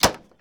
Plane P40 Click Hatch Panel; Airplanes

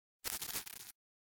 Insect Buzz - Short And Thin; Hollywood Edge; sound designer's toolkit v2

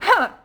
Boxing Female Punch Grunt

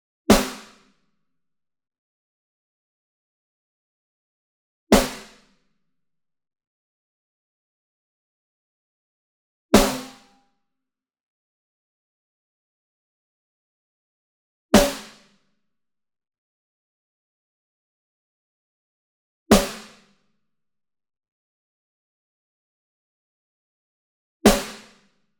Single Snare Pearl High Tension Hit Rim Near; boom library; Cinematic Strikes Construction Kit

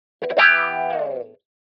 Guitar Thin Wah-Wah Finale Chord; Hollywood Edge; sound designer's toolkit v2

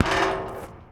Street Ball Metal Basket; SFX Kit; Street Basketball

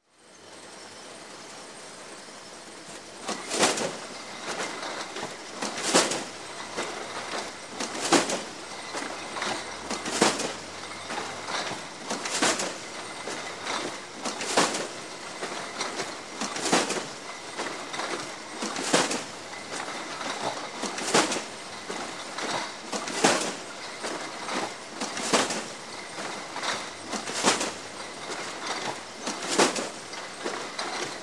Bottling Plant Bottles Loaded Into Cases, Facto; Sound Ideas 2000 Series

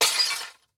glass break; Blind Drive

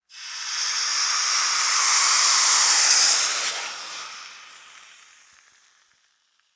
Steam Sizzle Hit

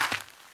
Gravel; blindside

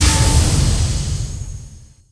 Steam Burst

